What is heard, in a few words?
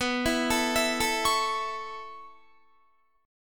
B 7th Suspended 2nd Suspended 4th down Guitar, chord; B7sus2sus4; arpeggiated